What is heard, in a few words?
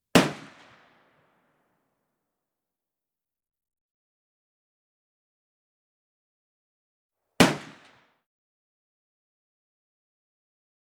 Gun Musket Single Shot x7; Electa Quinney